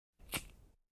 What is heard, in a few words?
gas lighter; فندک گازی